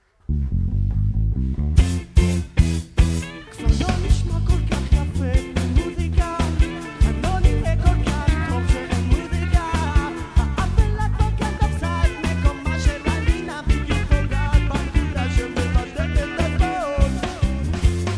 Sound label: music